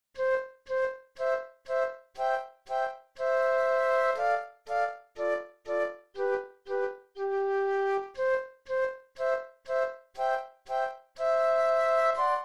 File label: Andante Theme Flute Trio; classical; Haydn